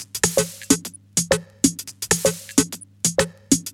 Minimal Loops

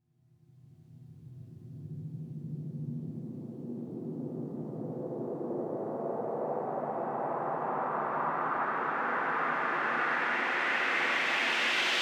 SweepFx 138BPM; Sound FX; E minor; Vengeance Samples; Freefall Kit

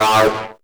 Acid Sounds A, Synths; Vengeance Samples

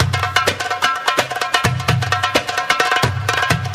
Latin House Loop 128 BPM; Vengeance Samples

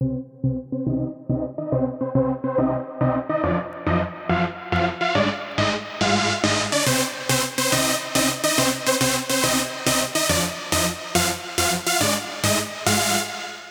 Synth Lead, 140 BPM; Pitched; Vengeance Samples; Vocal-Hook Kits; Song Kit; If You Know

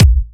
Bassdrum; Vengeance Samples

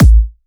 128BPM Kick; VFH1 128BPM Diesel Kit

